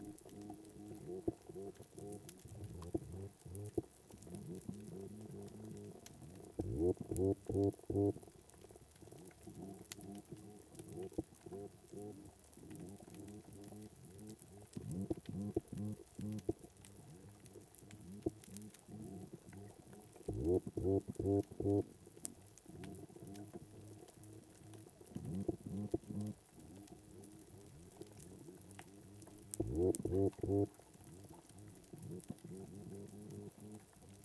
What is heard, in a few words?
ambient recording